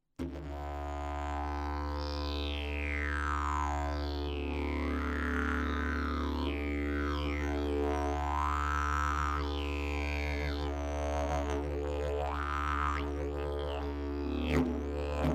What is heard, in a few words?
didge